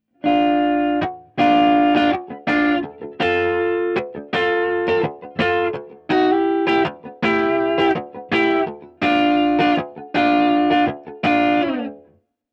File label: Beetronics Seabee; Factory Preset